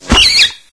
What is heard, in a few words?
roach die; mistake coop